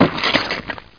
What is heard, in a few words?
splat